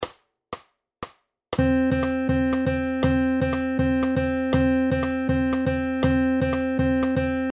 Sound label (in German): 4 ueber 3 34; In the 4 over 3 rhythm, we play 4 notes within the 3 beats in 3/4 time